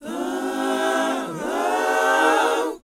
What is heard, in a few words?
BOYZ, Vocal, R&B Dance